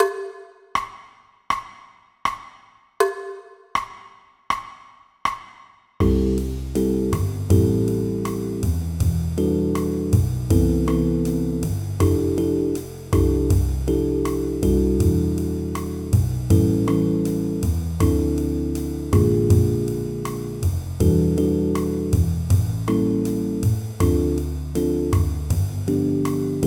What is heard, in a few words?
play along 80 tempo